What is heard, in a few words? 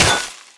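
movement pick remove snow; footsteps; Call of Duty Modern Warfare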